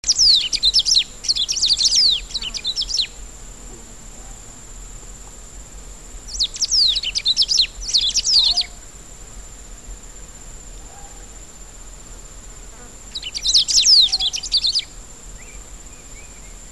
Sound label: Saltator atricollis